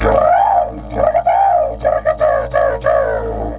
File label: A3 WILD, Didgeridoo; Big Fish Audio - Didgeridoo and Other Primitive Instruments